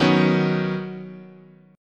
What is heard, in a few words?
Eb Minor 7th Sharp 5th, chord